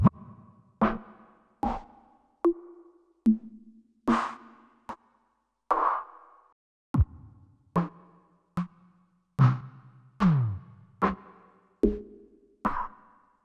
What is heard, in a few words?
Yamaha Tenori-On Scrub kit